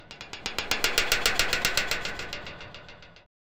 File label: ancient lock mechanism un